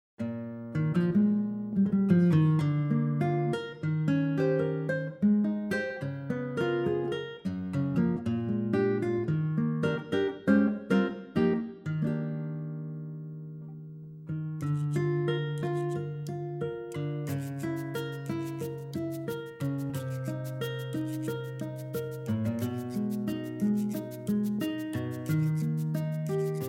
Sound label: Backing Track guit